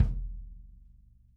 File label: kick; Sonic Reality; Interactive Drum Kits; 90s sample cds